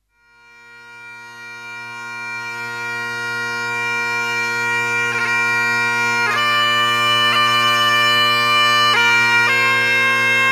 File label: bagpipe